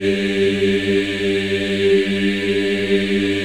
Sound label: G2, Choir; USB Soundscan vol.28; Acoustic & Synth; AKAI